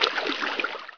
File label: swim freestyle; hereticiidemo; player